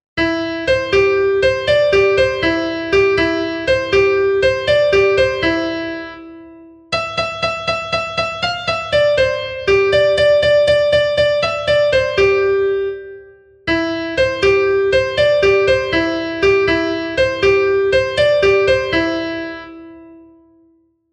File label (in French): Chevreuil de Bourgogne, trompes